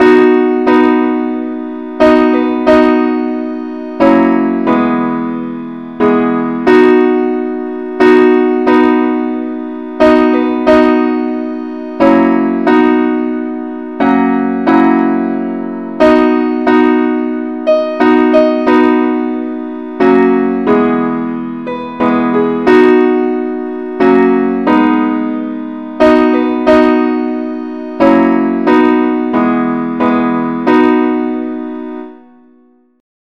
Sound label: simple acc keyb